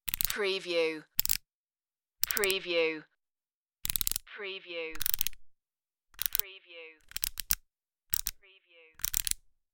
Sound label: revolver cocking